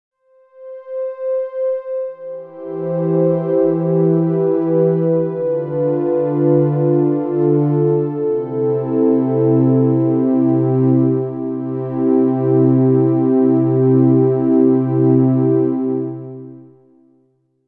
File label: String Pad Piece